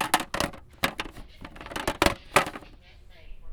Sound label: Plastic Rattle; BB Retail Therapy Sample Pack